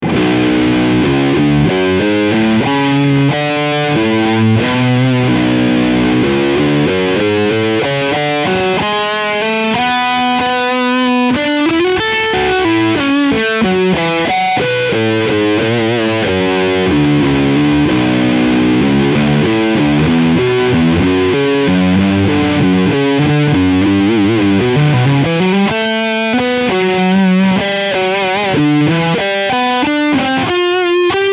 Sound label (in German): Lead Low B Steg HB